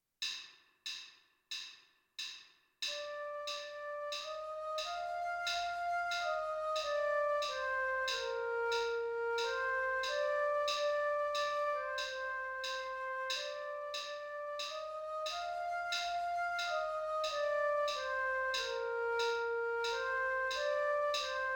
flute

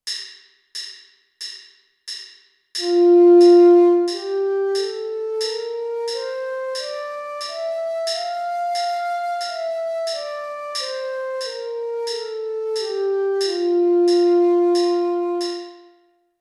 f major scale flute

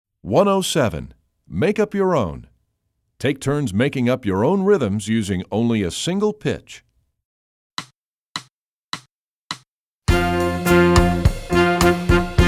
latin rock rhythm

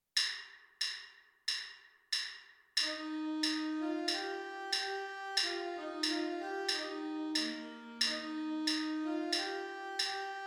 alouette alto sax

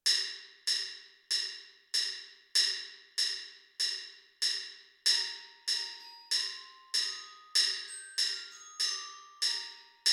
mallet percussion

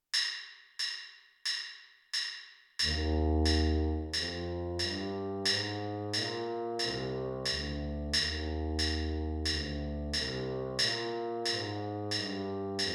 e flat major scale tuba

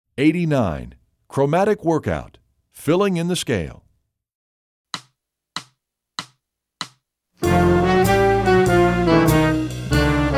chromatic workout

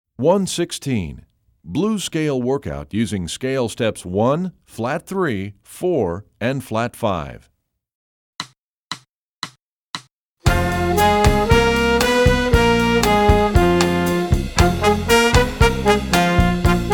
blues scale workout

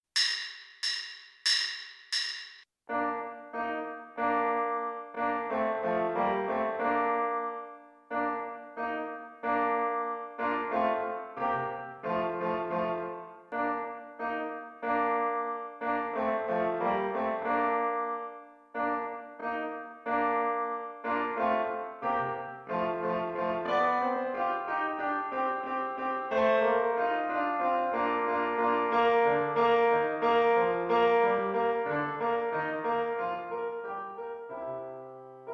finale for clarinet piano only slower

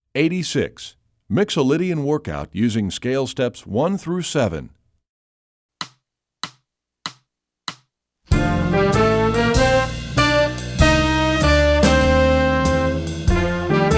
mixolydian workout scale step

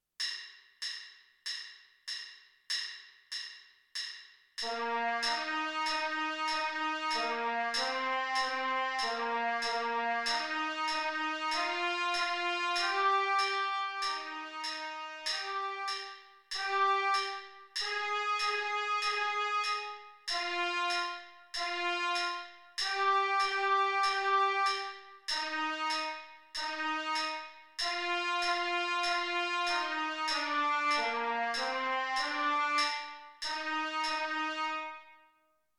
trumpet

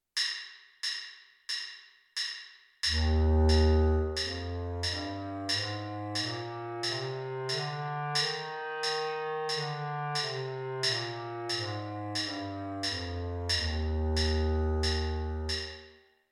f major scale bass clarinet

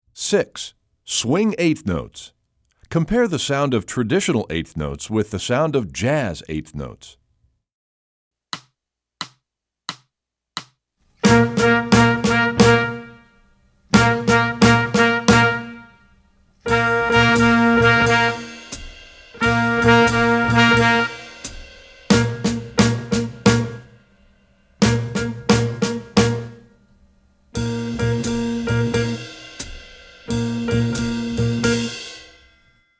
swing 8th notes

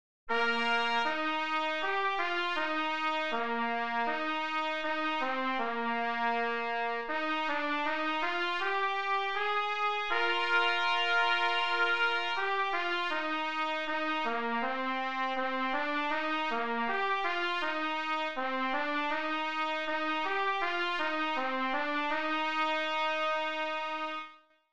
trumpet

